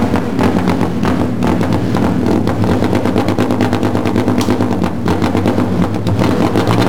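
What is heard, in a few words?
idle exterior, Kart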